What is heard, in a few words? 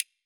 button; pixelheros